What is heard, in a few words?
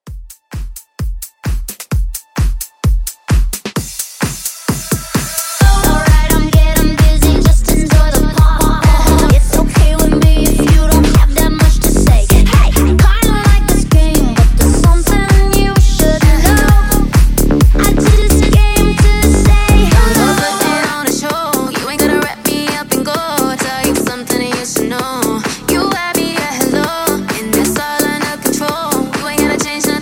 Club, 130